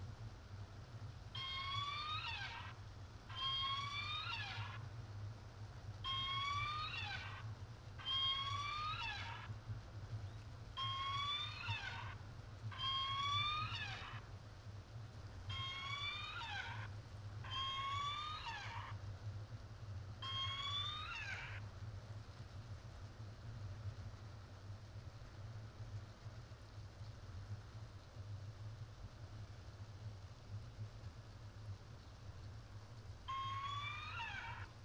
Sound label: kw playback